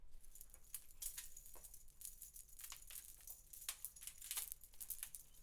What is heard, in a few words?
Door with hanging beads